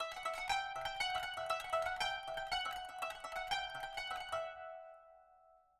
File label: kanun